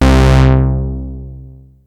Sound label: Synth oneshot Stab C, Synth Bass One Shots; Slate Digital BASSHOLE Sample Pack